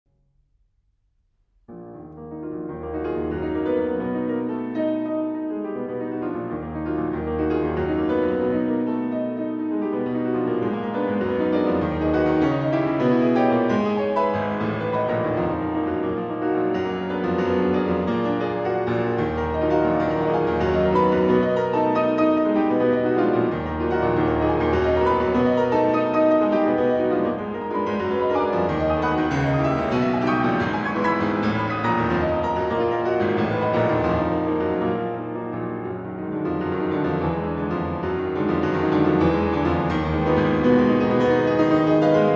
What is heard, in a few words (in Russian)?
Прелюдия ор.11 №14 ми бемоль минор; Скрябин